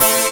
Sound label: FM DANCE; Best Service Dream Experience